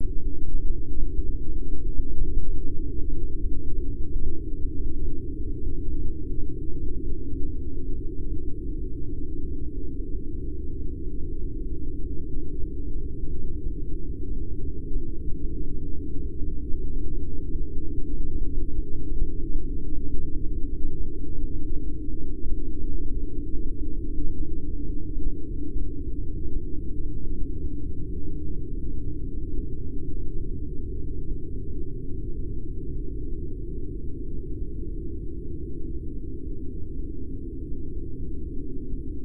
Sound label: ambience